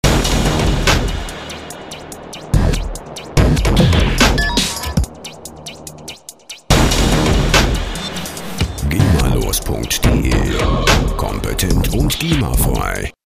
rhythm

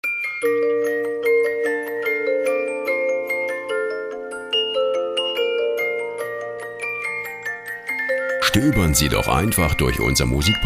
music box

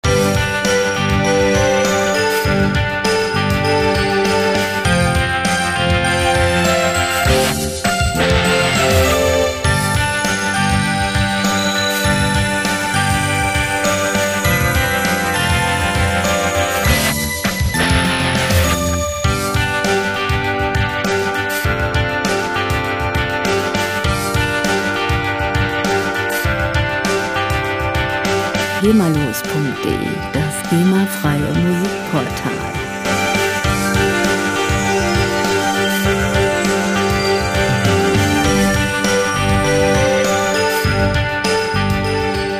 tin grunge

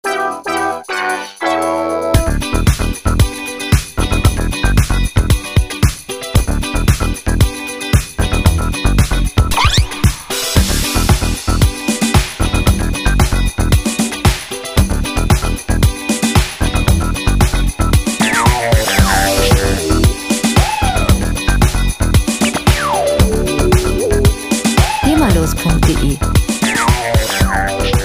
robot disco

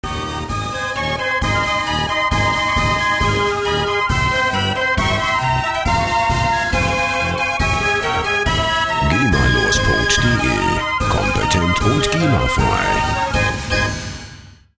theater organ

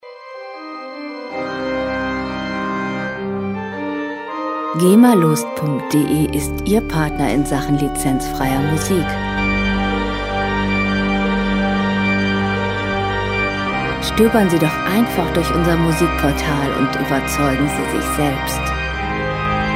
halloween pipe organ

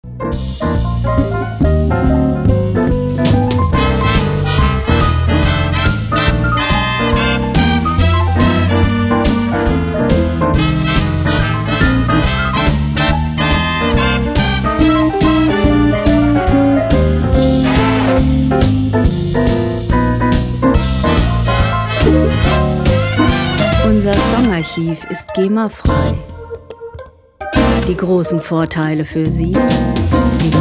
swing orchestra

